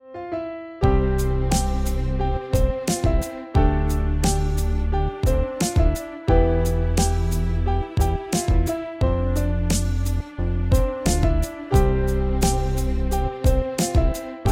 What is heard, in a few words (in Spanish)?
INSTRUMENTAL; BLAS DE OTERO; radio3 raportajes